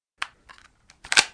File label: pistol reload; CastleMiner Z; Xbox 360